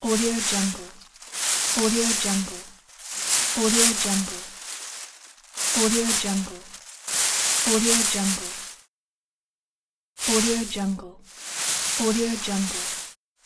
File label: dry reeds